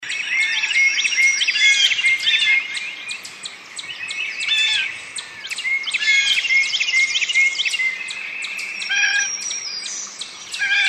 Kentucky Forest; forest melodies

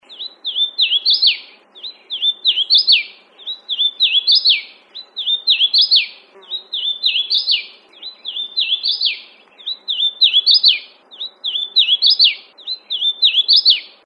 Hooded Warbler

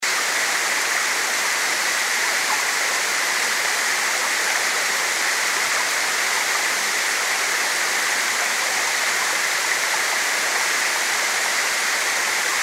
Slate Falls; creeks and waterfalls

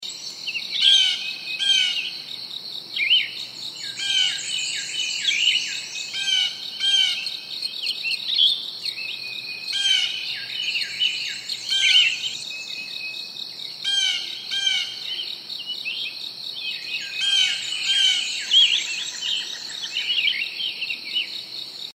Evening Chorus; ozark mountains

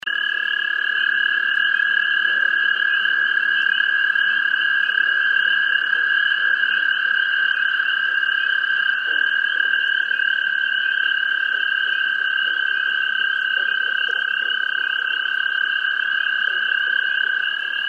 Toad Haven, toads frogs

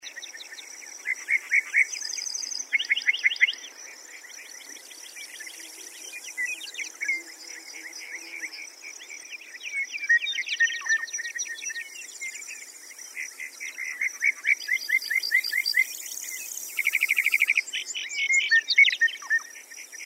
Prairie Melodies, north dakota potholes

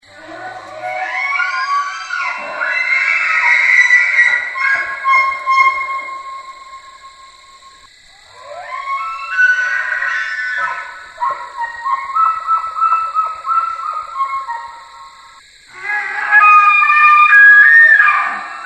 Elk

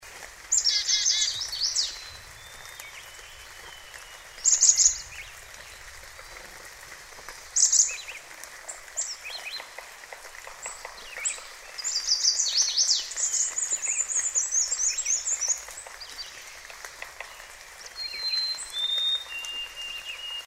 Receding Storm; upper peninsula

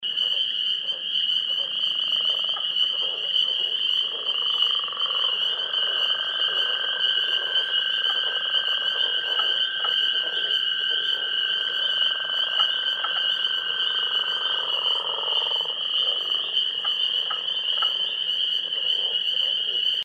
Mid Ohio Chorus, frogs in chorus

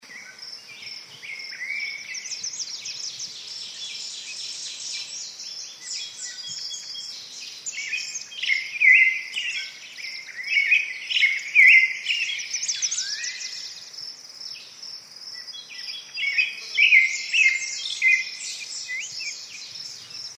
Copper Forest; upper peninsula

